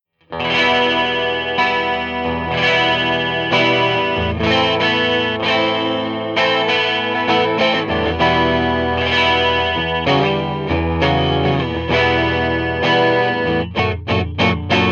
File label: Fender 12; Sir Charles